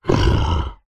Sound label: horror monster soft snarl; zapsplat